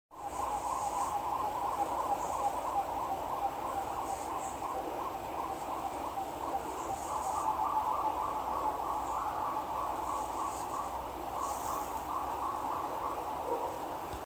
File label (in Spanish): Uru